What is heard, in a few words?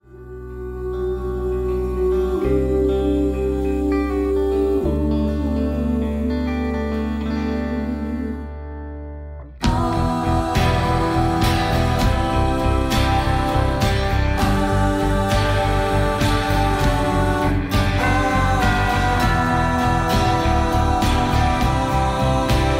karaoke